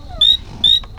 Ameerega flavopicta

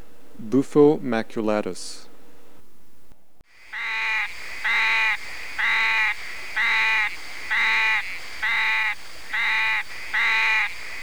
Sclerophrys maculata

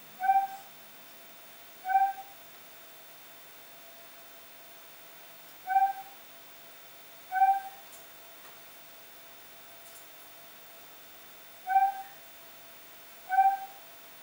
Theloderma corticale

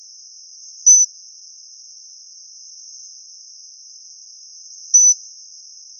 Stumpffia pygmaea

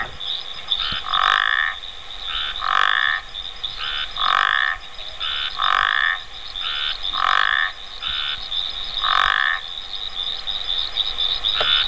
Trachycephalus dibernardoi

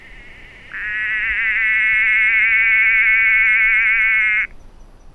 Physalaemus olfersii

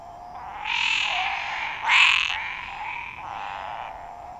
Boana paranaiba

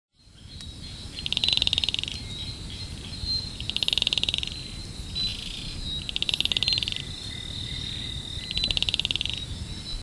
Gephyromantis malagasius

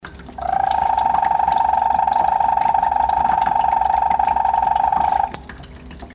Scaphiophryne spinosa

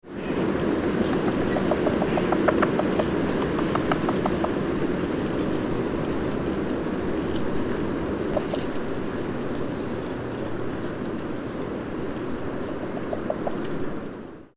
Rana luteiventris

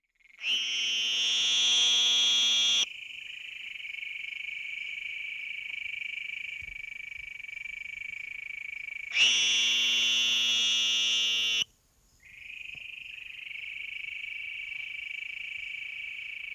Anaxyrus retiformis

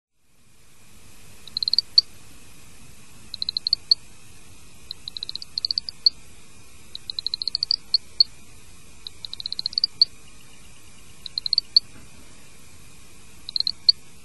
Spinomantis bertini

